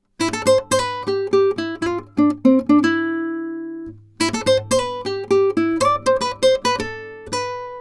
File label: TOTAL SPANISH GUITAR